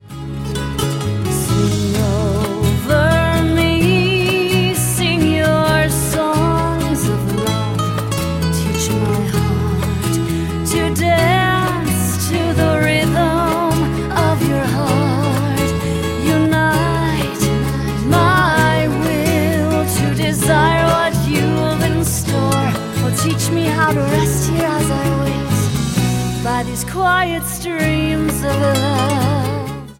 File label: sing